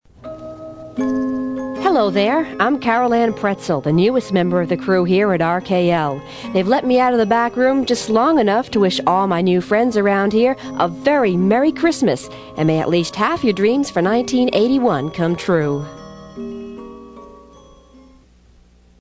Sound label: xmas carol